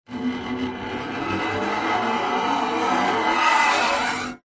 sound design guitar acoustic scrape strings rising horror eerie tone processed